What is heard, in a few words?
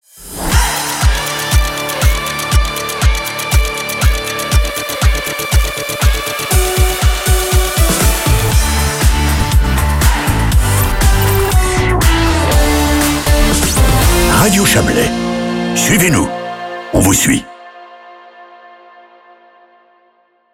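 ELECTRIC CHARGE TOP, jingle; RADIO CHABLAIS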